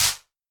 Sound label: Wider Clap